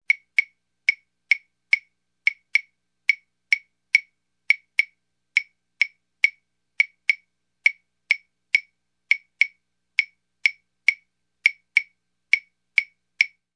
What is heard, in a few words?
Claves rhythm